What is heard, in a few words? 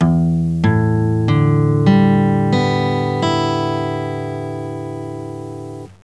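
ALL-STR, guitar tuning; TUNNING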